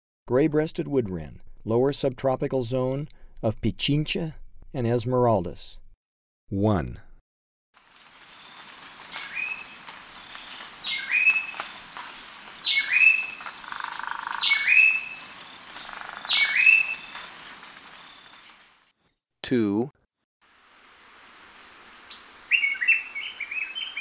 Gray-breasted Wood-Wren (lower subtropical), Birds of Ecuador; S. America